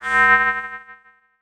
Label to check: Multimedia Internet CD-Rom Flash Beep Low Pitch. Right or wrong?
Right